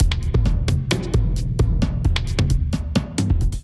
Kit groove 132 bpm, Drum; MIDI Clips; Borella Kit groove; Ableton; Factory Packs; Drum Essentials

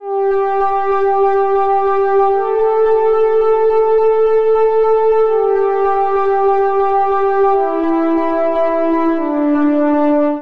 Ambient Swells Pad A Minor 92 bpm; Melodic; Tonal; MIDI Clips; Drive and Glow; Ableton; Factory Packs

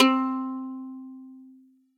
Plastic Guitar; Instruments; Plucked Items; Ableton; Factory Packs; Lost and Found

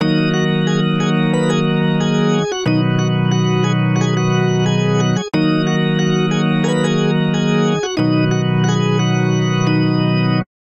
Vamp R&B Organ i - VI Eb Minor 90 bpm; Electric Keyboards; Ableton; Factory Packs

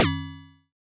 Alien FM Perc Short

